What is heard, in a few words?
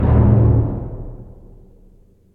B Drum